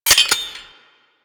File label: Four Barrel Rifle Mech